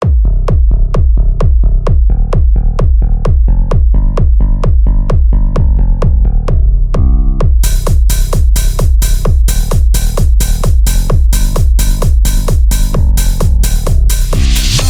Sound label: WITH BASS SOLO